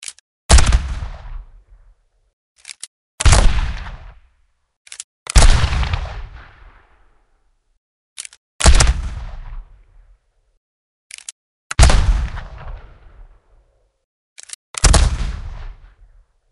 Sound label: gun sounds